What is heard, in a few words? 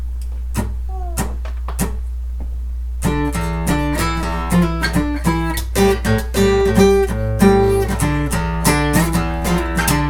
riff